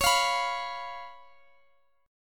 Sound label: Db 7th Db down Guitar Open D